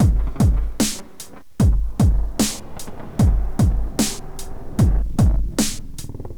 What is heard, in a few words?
place in dirt, Drum loops Electro